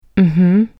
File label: woop mmhh; WoopTechnique